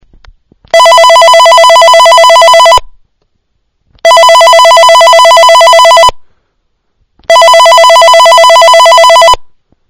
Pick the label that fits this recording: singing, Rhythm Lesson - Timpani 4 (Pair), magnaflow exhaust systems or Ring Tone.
Ring Tone